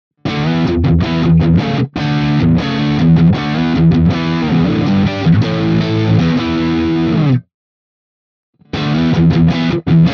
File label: Ibanez AR520HFM rhythm all positions Riff A